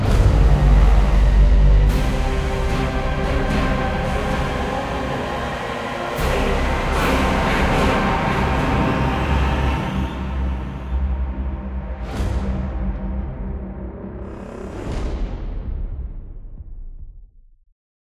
Short Music

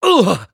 Damage Male

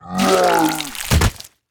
Troll Die

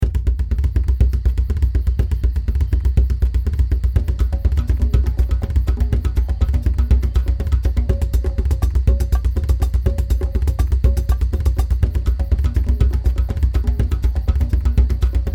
Background Music; gamerip; temple run

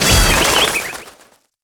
Bird Cage Breaks; angry birds rio

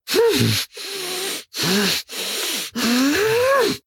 Mouthless Monster Spawn; devour 2021 gamerip pc macos

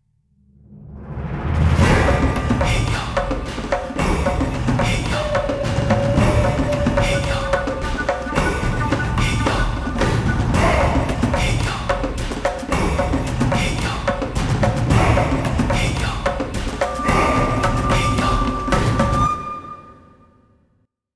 Monster Hunting Combat; Skellige

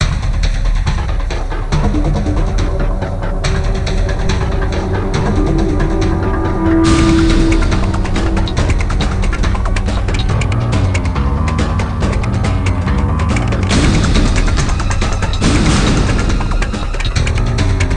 Music Action; Emergency Kids; nds; Music Action 04

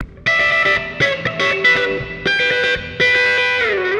Guitar wet

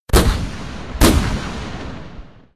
Ambience Gun Shot

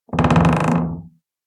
Door squeak creak

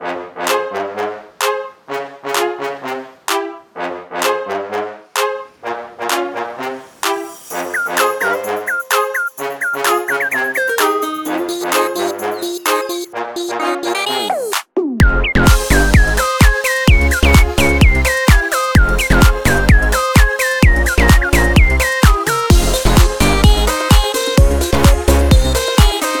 Groovy Brass

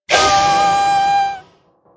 Ice Launch

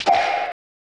Kick Ball; snes; Sanrio World Smash Ball

